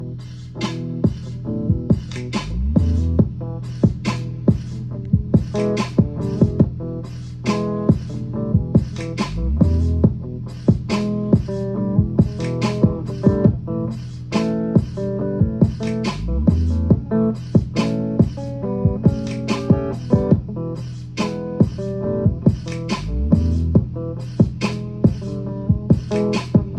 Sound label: harmonica